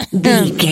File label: voice female cough interrupt